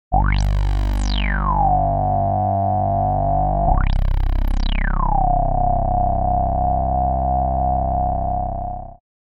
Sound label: bass resomoog